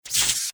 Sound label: broom zoom miss